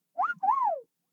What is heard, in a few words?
alien whistle; prosoundfx